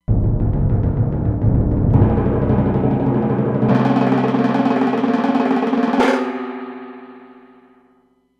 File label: Timpani Roll